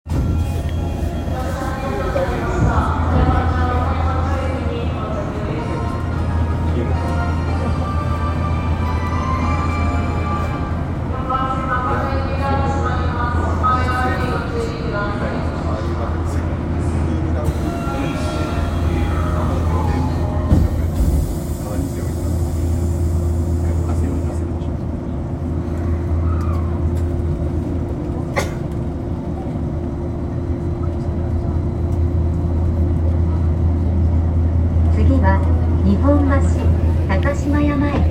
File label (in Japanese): 東西線茅場町西, train sounds